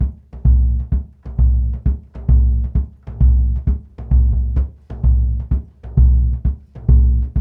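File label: 130 bpm, ethno drums, low drums; Spectrasonics - Supreme Beats - World Dance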